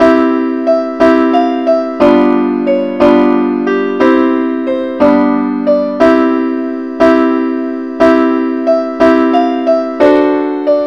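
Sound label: angel voices simple accompaniment key C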